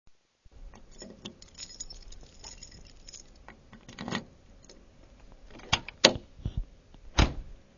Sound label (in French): auto entree voiture